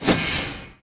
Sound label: whoosh, weapons